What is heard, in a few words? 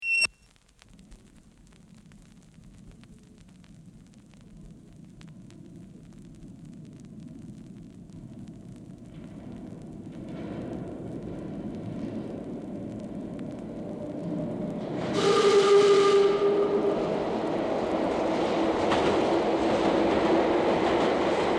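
Train passing in station (in tunnel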